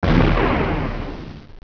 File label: Die; Virus: The Game